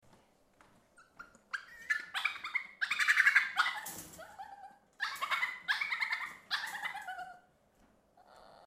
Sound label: chirping